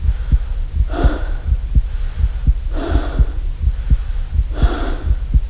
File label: breathing